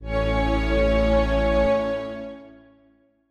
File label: Strings